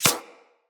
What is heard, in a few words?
Laser Impact Metal